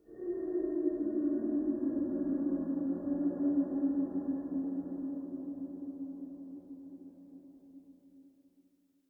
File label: Distant Pod, Ambient